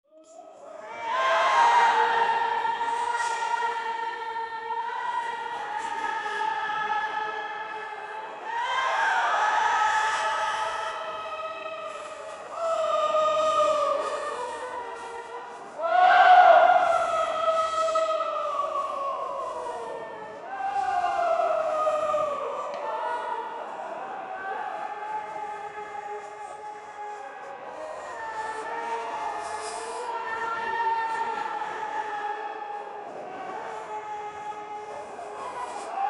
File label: crying, anguish; jesus christ